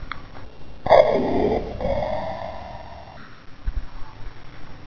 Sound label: breath; Gloom3 rejects